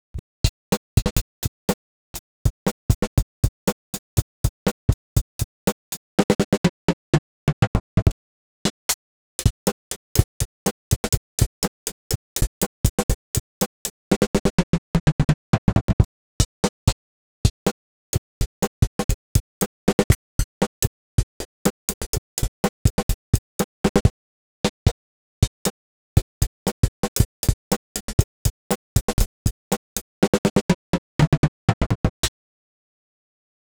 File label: Percussion Snap; Extractor